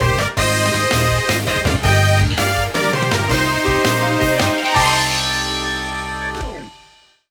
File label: Battle Jingle; Rogue